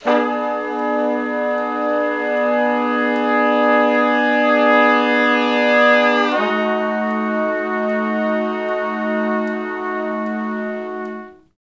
Slo Blues Chords [IV, IV, I ,I] (B), Horncraft for R&B; Sections; Crimson Blue and Fabulous Horncraft 4 RnB